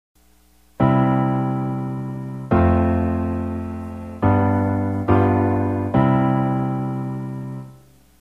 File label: harmony piano block chords